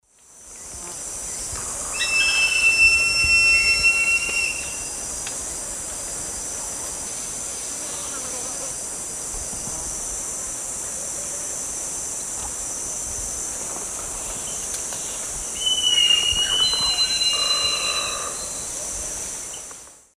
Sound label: Buteogallus urubitinga, call; S. America; Eastern Lowlands